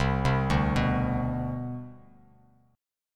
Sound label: C Major 7th Suspended 2nd, C, down, Bass Standard; CM7sus2 arpeggiated; JGuitar